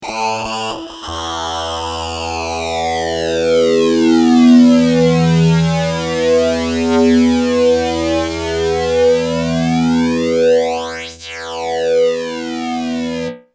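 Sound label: fuzz guitar, ADA flanger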